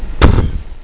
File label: poof